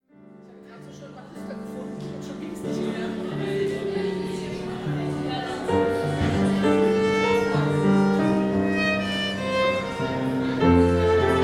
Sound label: Classic Ensemble Empfang